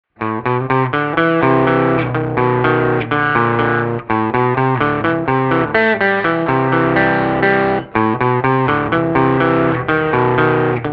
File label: Guitar - Saturator